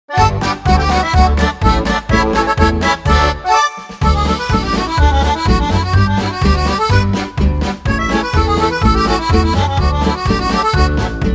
polish traditional music polka

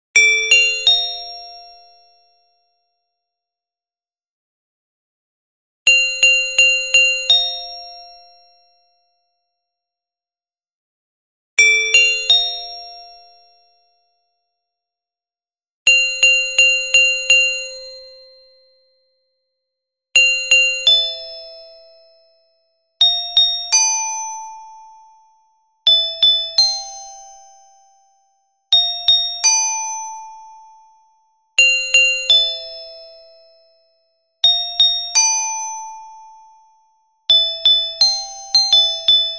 Carillon Soprano; Bo Nadal

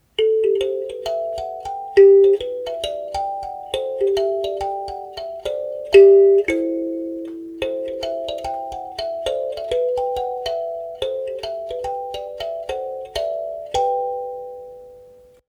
kalimba

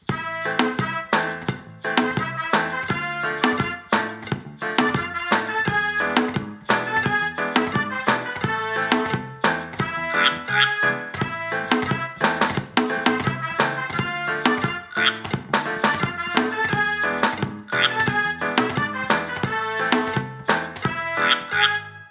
CUMBIA

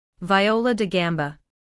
viola da gamba

